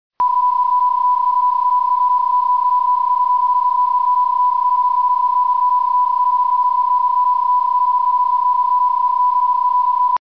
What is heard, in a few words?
PSKAM31